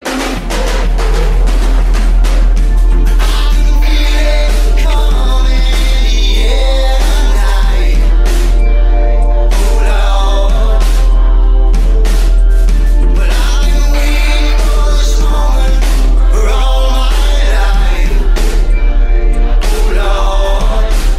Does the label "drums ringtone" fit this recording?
Yes